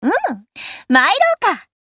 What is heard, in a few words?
Tone